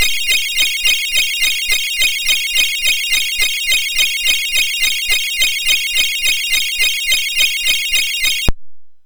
Sleigh Bell Sounds, MOS6581; MOS6581R3

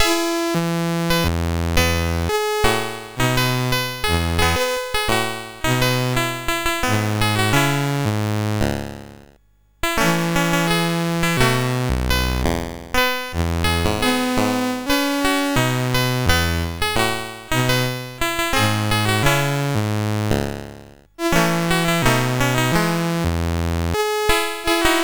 sid CSG8580R5

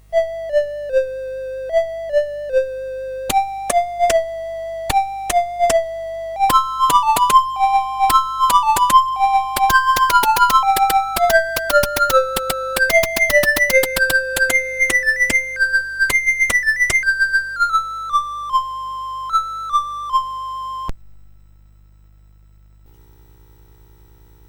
Christmas, CSG8580R5; Three Blind Mice

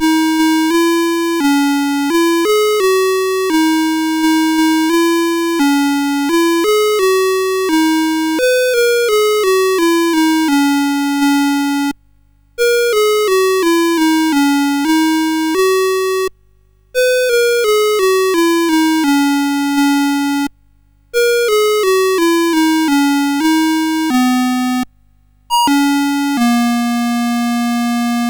sid CSG8580R5

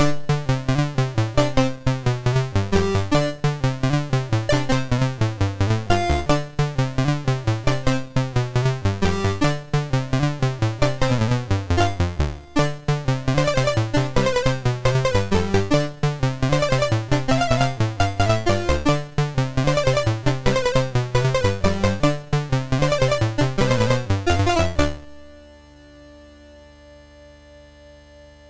MOS6581R2, sid; Pocket Rockets

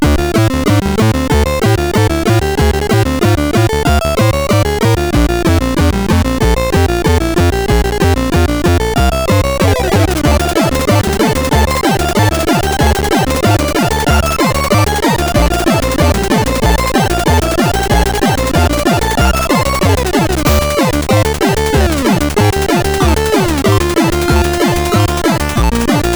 Weird Battle, CSG8580; CSG8580R5

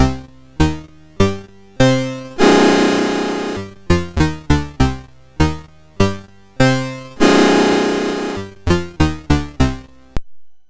Chords, MOS6581R2

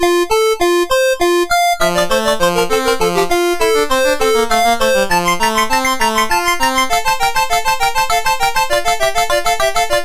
Two Part Invention No8 in F Major, MOS6581R3

